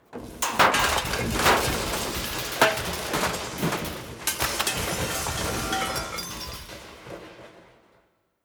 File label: clang and crash; ocean-commotion